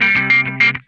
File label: GRAINY WAH, Funk Guitar; Zero G - Funk Guitar